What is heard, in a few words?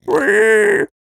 piglin retreat; Minecraft